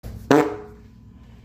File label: loud clap fart